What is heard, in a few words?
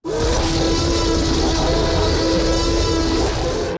T-Rex Roar; Commercial